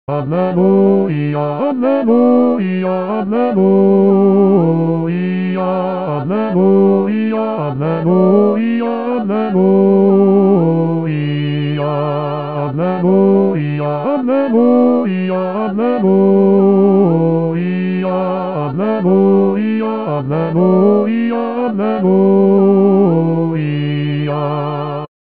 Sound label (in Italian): alleluia ritornello